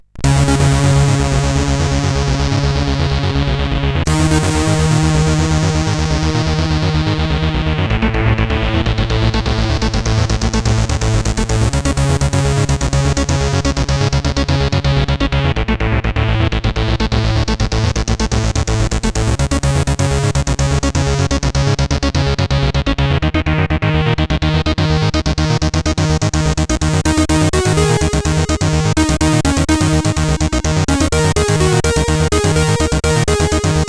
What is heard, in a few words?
sid CSG8580R5